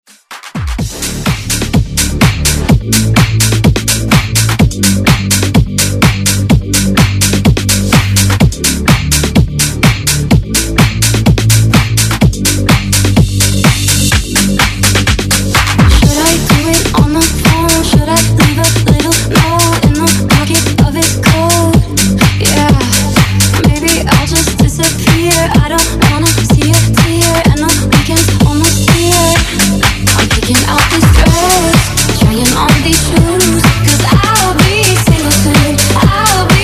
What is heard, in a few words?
House Redrum